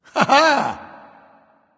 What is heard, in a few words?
"ah", discover; smite; voicepack; ullr